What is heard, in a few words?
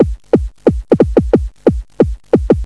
rave kick; lmms